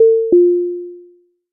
attention Ping

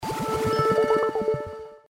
fish entered; ThreeHeadedDragon

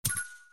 big button red; plinko

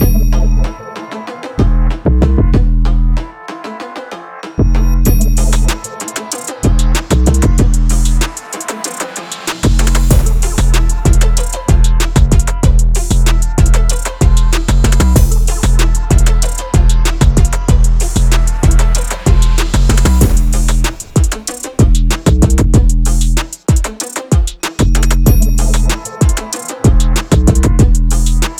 trap

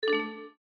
player left; ThreeHeadedDragon

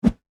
jump

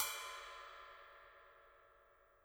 ride, jazz

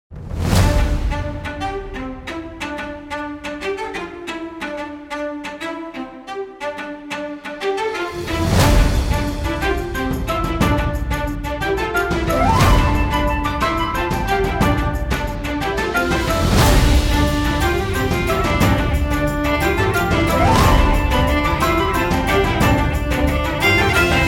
music

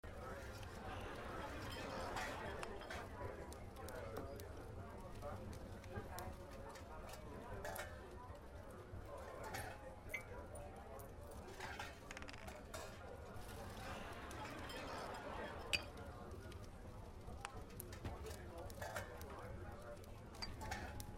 amb tavern loop; ambient; everdawn

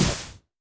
win Line Flash; jumanji

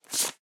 wood tile pickup

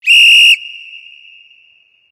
whistle

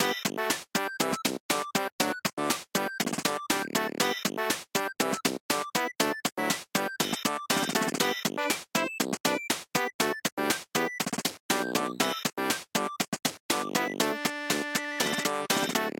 brass; retro bowl

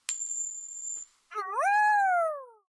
aowu

